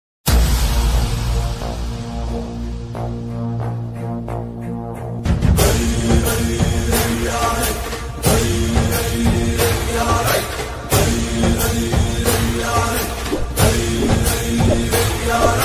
telugu bgm ringtone, south indian bgm ringtone

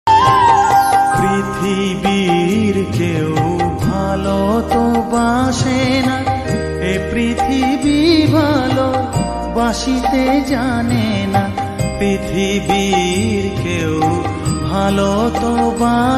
ringtone; shyama sangeet; maa kali

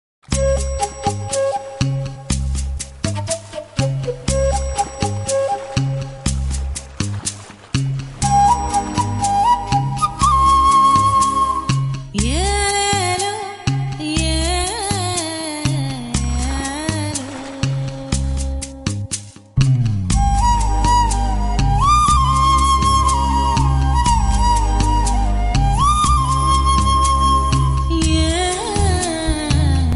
serial ringtone; saravanan meenakshi

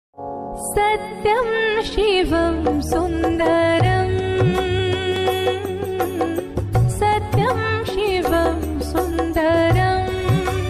bhakti geet

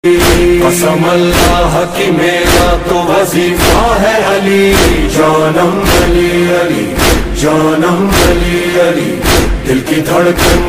noha ringtone, muharram nohay

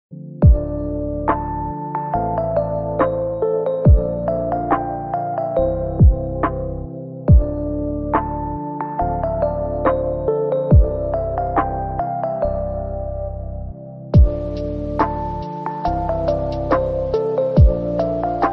anime ringtone

